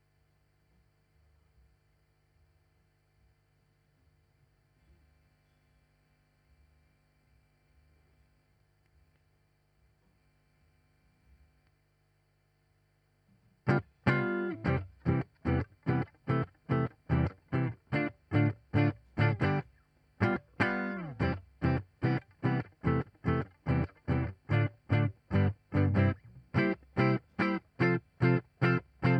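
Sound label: Guitar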